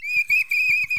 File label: BIRD, bird call; Greytsounds VOL-1; BIRD CALL FX